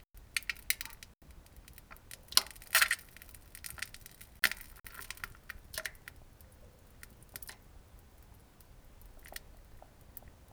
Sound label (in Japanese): なんべん：むちむち（たっ） ぷぷりっ むちち（ぽたっ） ち ち む